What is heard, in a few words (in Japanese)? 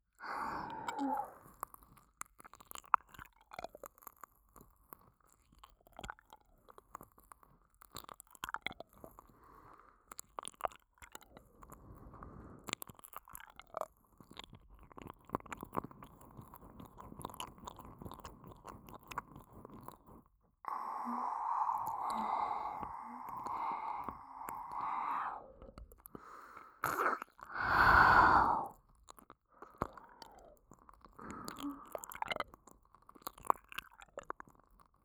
左耳舐め, ASMR; アドリブ集